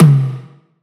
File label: tom full; Drum One Shots; Slate; SD MIDNIGHT Sample Pack